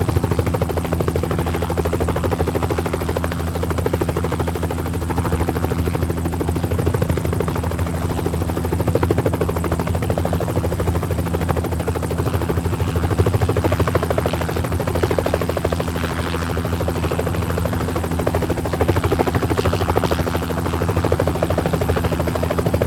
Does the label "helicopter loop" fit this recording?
Yes